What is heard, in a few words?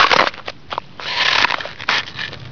CRASH